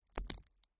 Fingers and bone being broken. Mutilation, Body Dismemberment, Gore Break, Bone